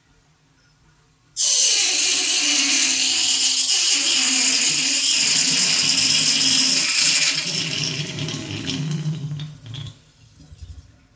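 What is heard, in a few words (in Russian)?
это шум закручивающейся по спирали визжащей воды стекающей по раковине; s normalnoj skorosty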